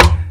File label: drum hit normal; Main skin but retarded; hitsounds; zerotwo